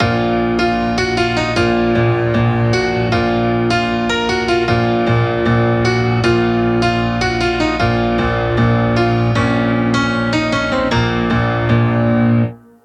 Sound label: Hip Hop Melody 154BPM